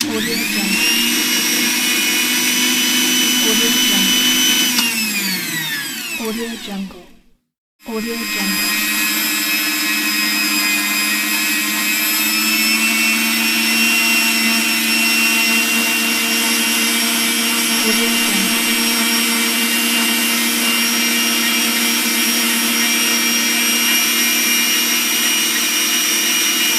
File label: electric meat grinder